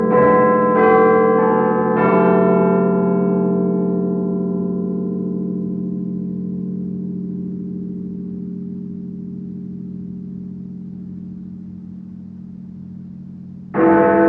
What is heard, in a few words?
big ben